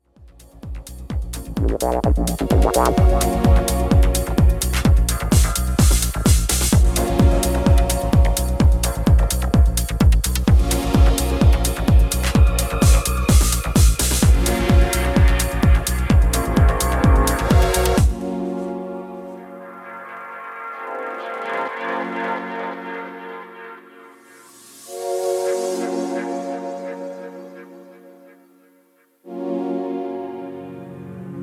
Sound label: Eversines Pattern Break